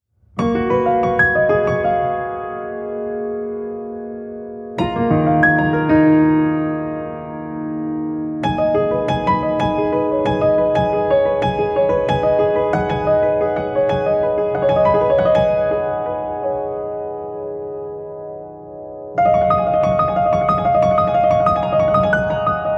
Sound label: Improvisation